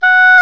Oboe f; Roland L-CDX-03 Disk 1; Oboe 4-8

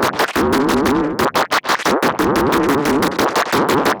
Modular Noise Rhythm (120BPM); noise hiss crackle

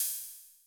cymbal (medium), Yamaha MR-10

